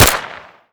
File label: kriss shoot